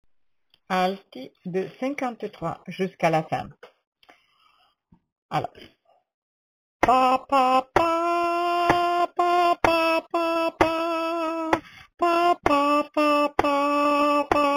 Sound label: alto lullaby